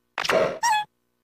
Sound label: Pluck; Pikmin